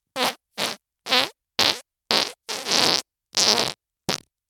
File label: fart; vegan